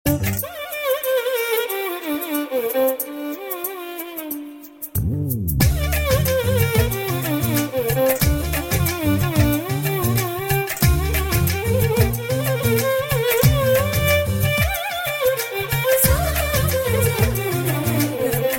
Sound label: Violin